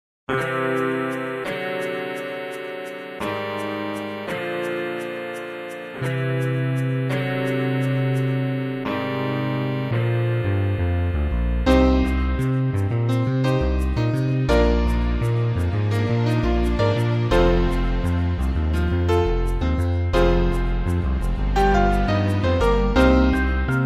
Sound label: reprise no drums bass